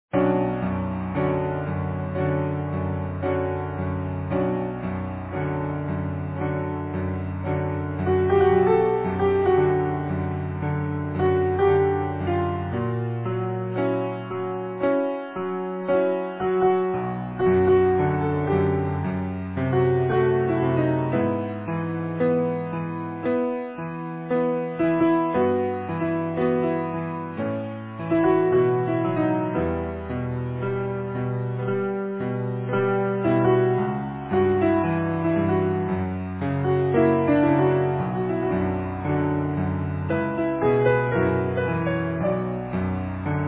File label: easy piano